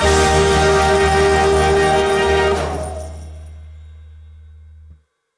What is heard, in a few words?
mega win end; netent